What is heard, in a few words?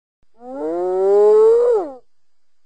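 hyena wooo sound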